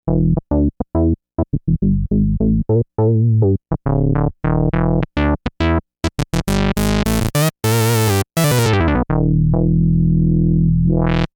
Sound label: bass; juno-gi mg bass 1